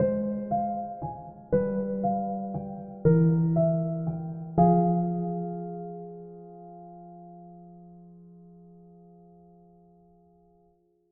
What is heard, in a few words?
Outro